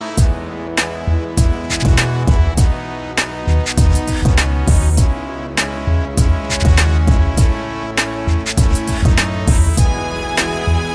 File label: Classic Organ